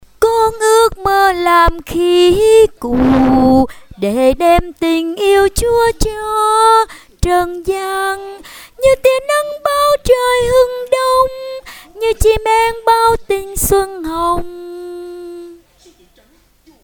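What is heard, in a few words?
soprano tenor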